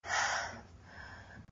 Breath; Lewd SFX

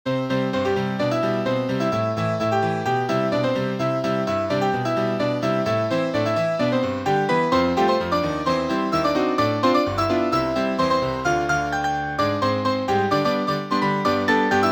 Ragtime Remix

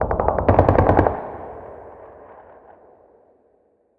city battle; War Ambience

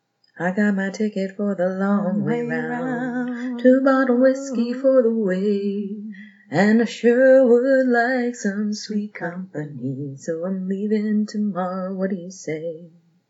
Cover Riff with Harmony

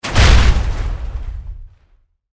Liberty Prime foot

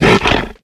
attack, Bullsquid; BUGBEAR

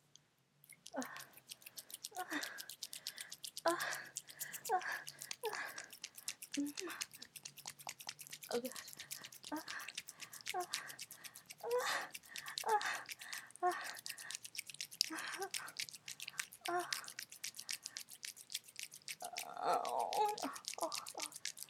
wet sounds

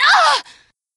Short Scream; Lewd SFX

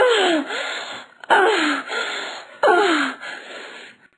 Moan

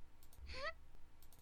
Breath; Lewd SFX

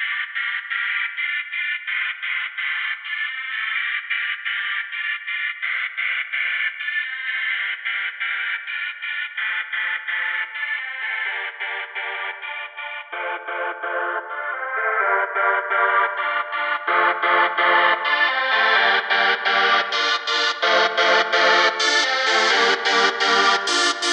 a chord sequence and a drum loop

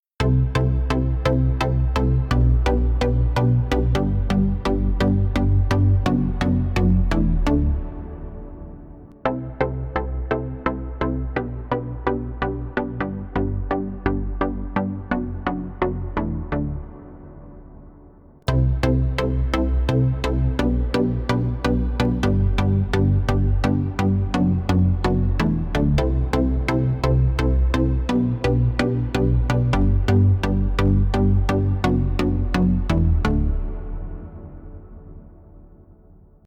Epic Beat

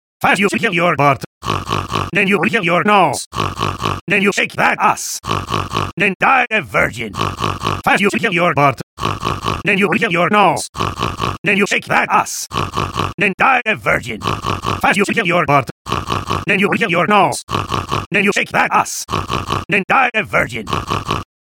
SNORT SNORT SNORT (Acapella)

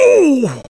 Grunt; Lewd SFX

